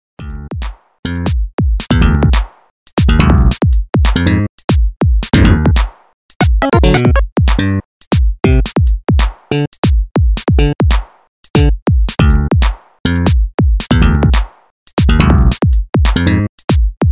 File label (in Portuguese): baixo